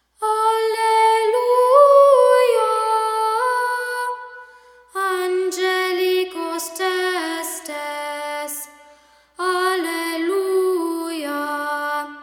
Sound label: alleluia angelicos testes